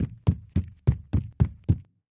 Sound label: Footsteps Running With